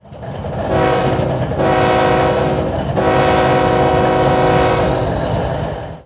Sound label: Nathan K-5HL-R2; RailPro